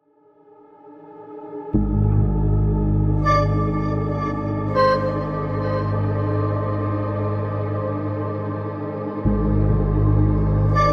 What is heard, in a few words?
SCI-FI DRAMA; Luke Sees Dots